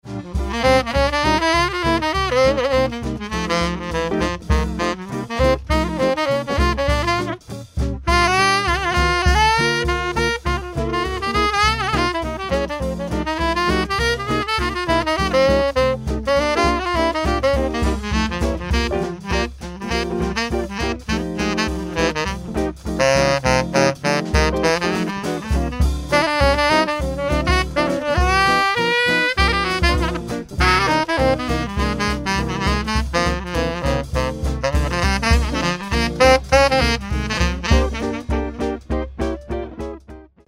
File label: sax